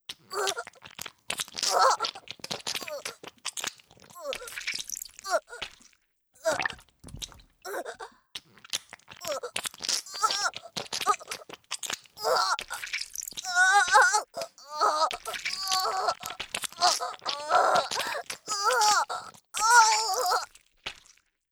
お゛～; 効果音あり

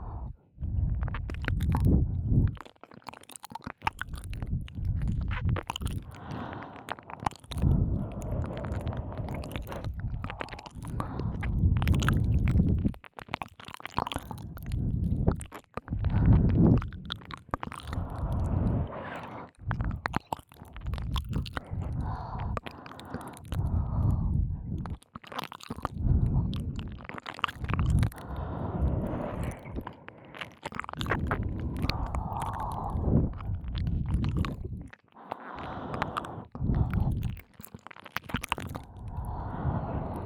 吐息耳舐め両耳; 耳舐めコレクション

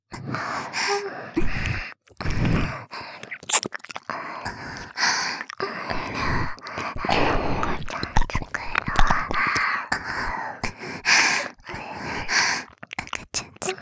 耳舐め喘ぎ; 赤ちゃん作ろ♪

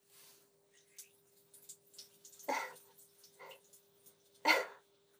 おしっこ トイレ モノラル; おしっこのみと編集版

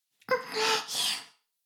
耳舐め音声 (LR逆ver); 古き城のドラゴニート2

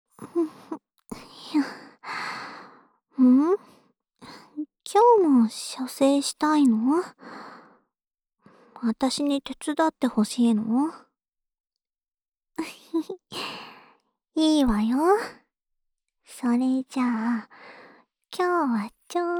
オナサポ用耳舐めループ（左耳）, ASMR

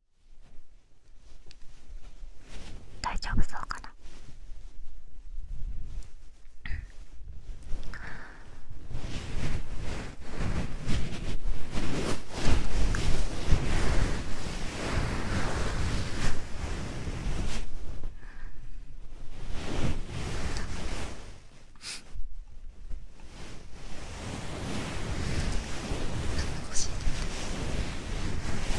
タオルマッサージ, ASMR

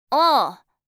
あぁ…; 田舎の肝っ玉姉ちゃんにボクは…; Windows向けシステムボイス

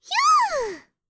ヒュー！, 客席

